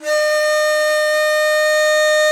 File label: BON DI, Winds, Old World Instruments